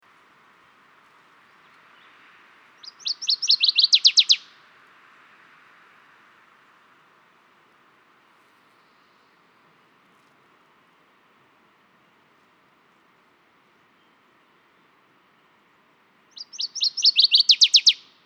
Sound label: Northern Waterthrush, Parkesia noveboracensis; warblers